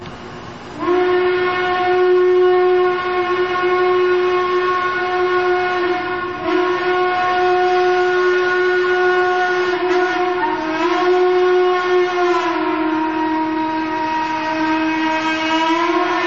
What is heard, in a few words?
horn